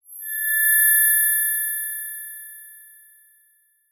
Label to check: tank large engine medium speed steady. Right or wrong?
Wrong